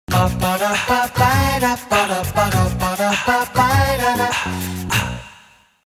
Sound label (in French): Emission link, jingle; Jingles medley